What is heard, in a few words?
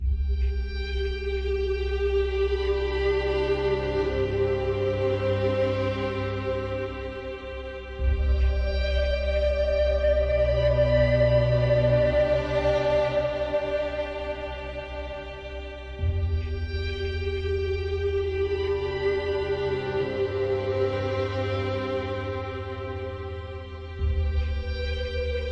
Closeness Ambient